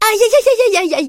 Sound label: hurt